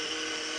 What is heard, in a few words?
engine; gi spy